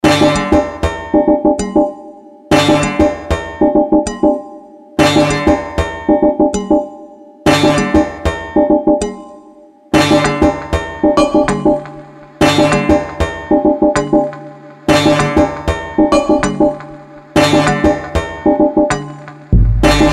Cyberfunk Ethnic; string studio

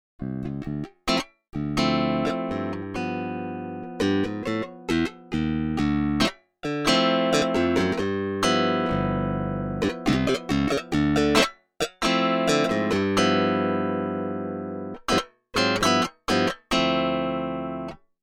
Electric Clavier Damper On; SS Masterclass

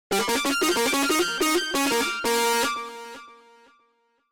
Hold And Hammer; SS Masterclass; Damper

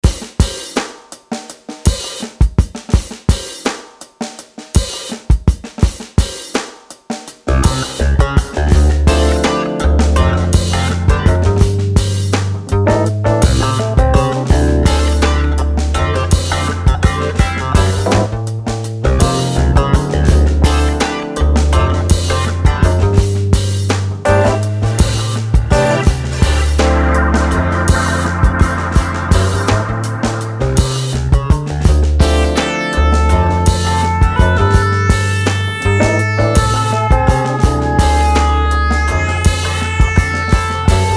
Cyberfunk Funky Christmas; string studio

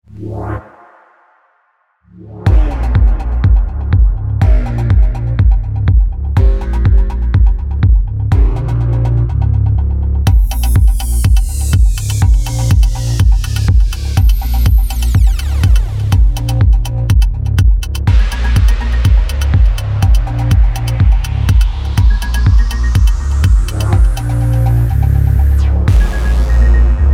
electric dub; rotors; adam pietruszko

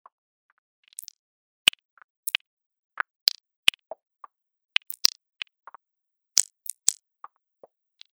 Velocity Noise Clicks; UA Masterclass; Trip-Hop

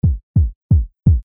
Kick; UA Masterclass; Trip-Hop

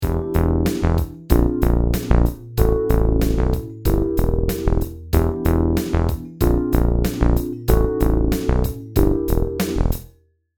Funk, Funk Disco Basses; UA Theme Packs

